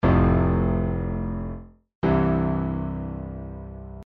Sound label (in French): Cadence plagale